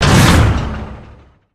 tank end engine stop; video games; player; taunt; source engine; tf

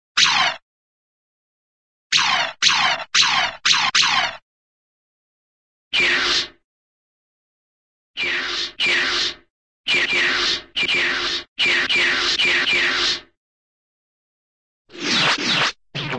sci fi nose cannon, single; cartoon

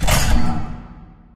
emitter; props; Source Engine; Portal 2

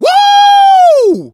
positive noise, survivor voice, manager; source engine; left 4 dead 2

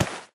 item boxing gloves drop

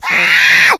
become enraged, infected, male; npc; alert; Left 4 Dead 2; Source Engine

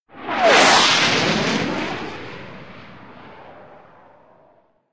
jet by; Left 4 Dead 2; Source Engine; jets

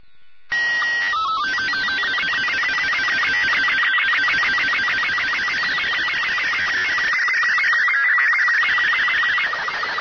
ambient; Portal 2; Source Engine; video games

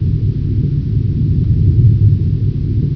rocket rumble; source engine; hl1; video games

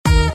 epic sax; Source engine

